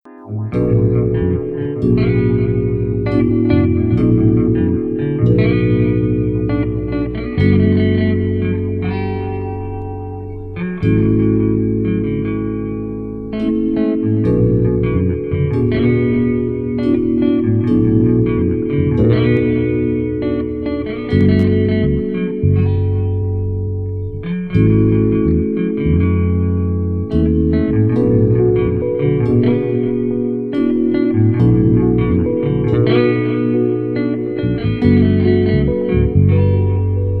chillout, 432 hz; prelude

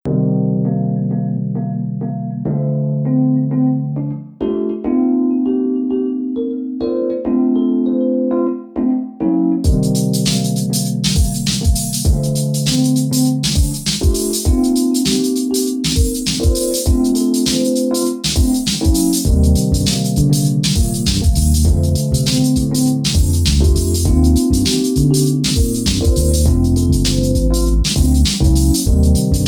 feel calm 432 hz; green dream

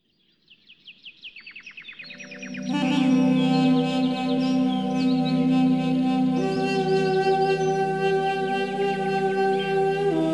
duduk with nature 432 hz; Summary of the heart

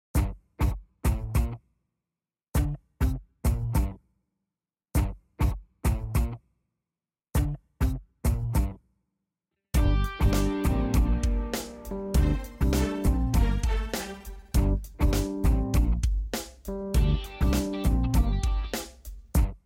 music dance fast; safe

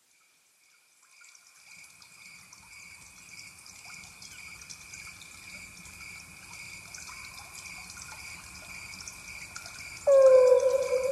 ambient with nature; wnetrze

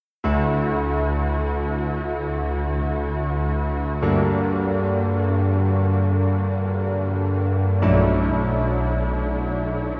heartbeat szept serca 432 hz; near heartbeat

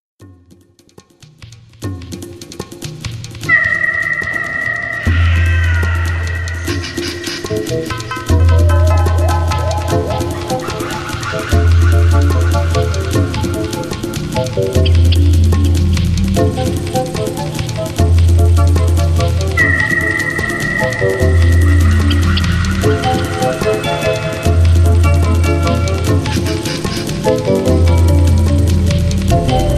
spa music 432 Hz

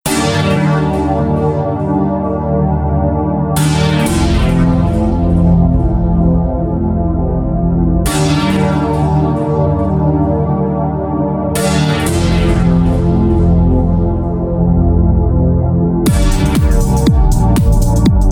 techno trip 432 hz

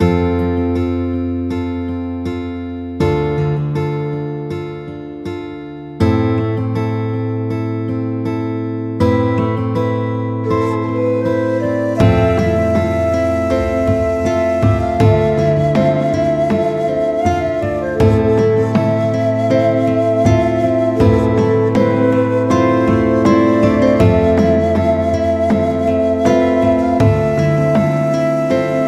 Guitar; serenada kwitancej wisni

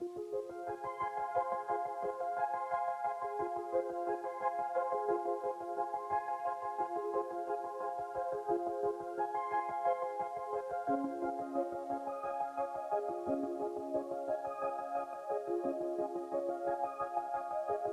enigmatic dance 432 Hz

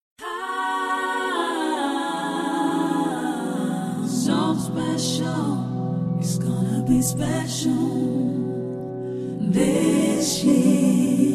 vocally 432 hz; special

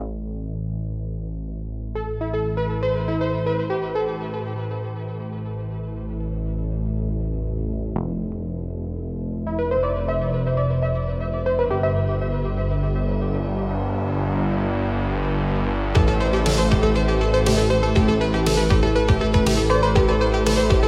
Electronic Impulse

